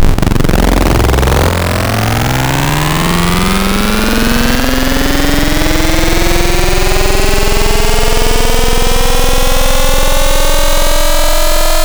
Harmonic Turntable, wavetables; Cymatics; Wavetables Collection Vol 3; Serum

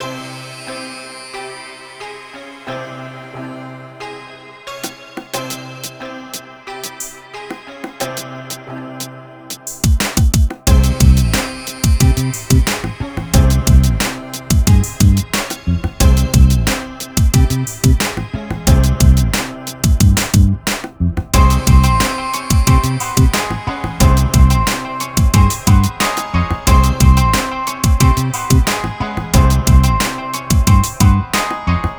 090 Cmin, Hip Hop Exotica; Apple Loops; Big Fish Audio

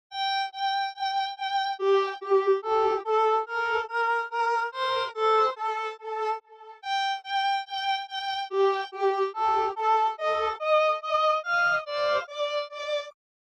143 BPM G Min String; Cymatics; Rise Up; Destiny Gold Expansion

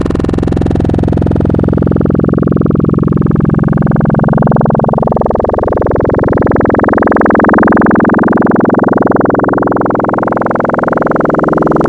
Wavetables; Cymatics; Nightmares Vol 2 Wavetables; Serum

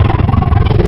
Alligator, Wavetables; Cymatics; Serum; Animals for Serum - Gold Edition

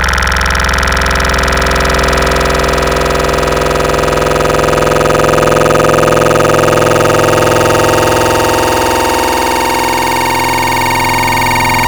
High Sync, Wavetables; Cymatics; Nightmares Vol 2 Wavetables; Serum

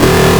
Analog FM, wavetables; Cymatics; Essentials Wavetables; Serum

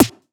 EDM Future Clap

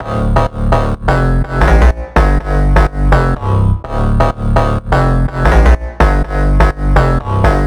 Big EDM Bass Loop D2; Future House; Future House Tools; Bass Loops

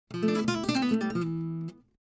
Guitar, Hip Hop Exotica, 086 Emin; Apple Loops; Big Fish Audio

